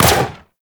Sentient Symbilyst Laser Fire; Warframe; Weapons

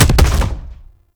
Grenade Launcher Punch; Weapons; Scaldra

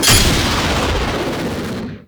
Rocket Pack Launch; Enemies; Attacks; Warframe; Grineer Ghouls; Ghoul Devourer

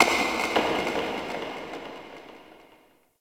Interior Cave Rock Smash; Misc Indoor

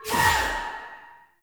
Choir Erupt Proj Throw

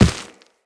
Run Dirt, Footsteps; Characters; Operator

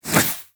Warfan Whoosh, Weapons Melee; Warframe

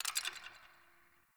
Ice Crack One Off

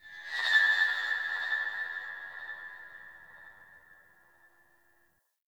Sentient Harmonic Cries; Warframe; Tau

